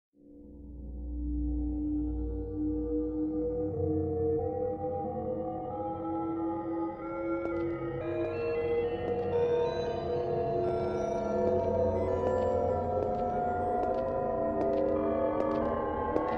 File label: footsteps